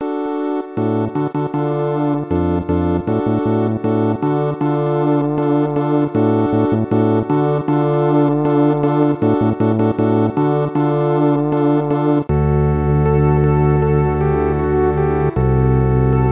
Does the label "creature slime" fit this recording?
No